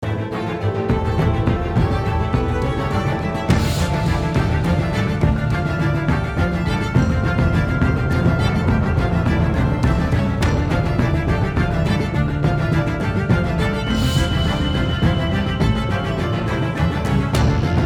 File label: Action Loop